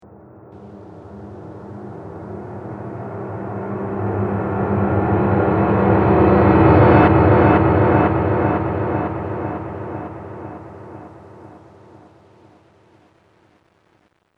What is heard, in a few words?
Piano Horror Short Drone